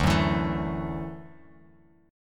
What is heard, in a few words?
D-Major Add 9th D down Bass Standard; strummed; JGuitar